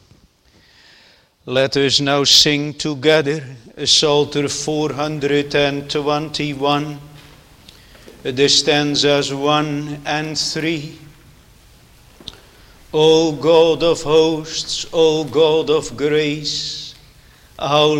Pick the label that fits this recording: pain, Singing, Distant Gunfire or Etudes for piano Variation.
Singing